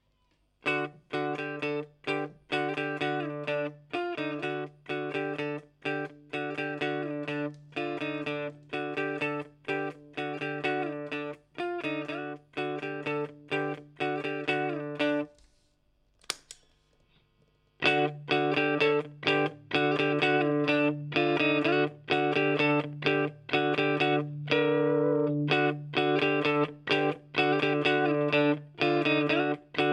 bend single coil

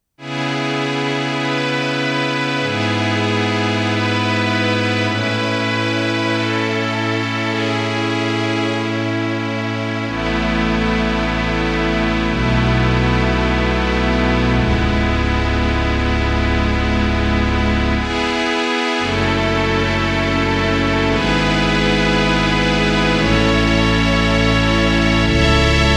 Strings; Casio CDP S100

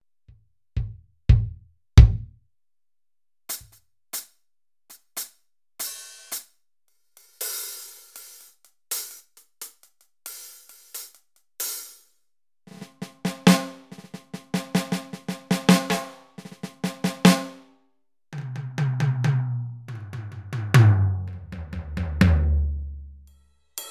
Kit

